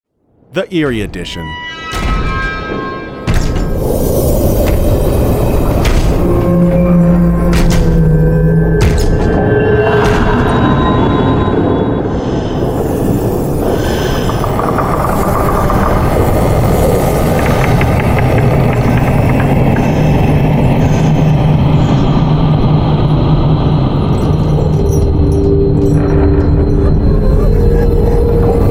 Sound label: dark scary Sound Effects